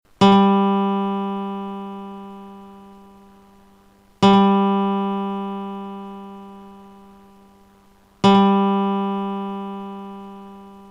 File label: G 3rd string Guitar